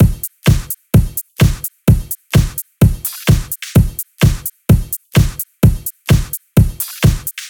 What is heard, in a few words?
128BPM Heavy Duty Kit